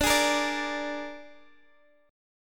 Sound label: Ab Suspended 2nd Flat 5th D, chord